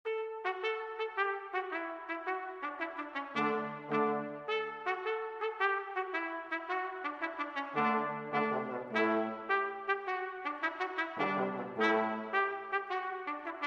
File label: Three Medieval Carols, Brass Ensembles; Angelus as Virginem